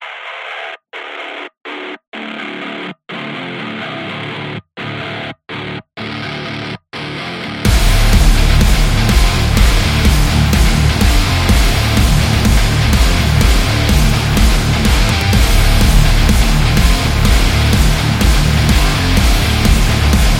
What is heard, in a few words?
Workout, Powerful metal background music built on heavy guitars